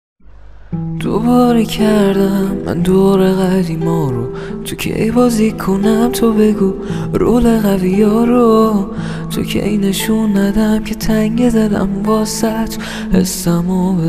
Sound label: Guitar